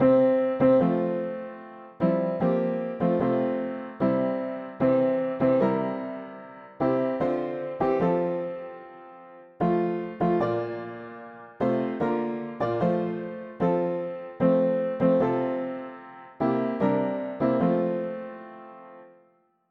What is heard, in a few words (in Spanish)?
mono piano; hymns; Himnario pequeno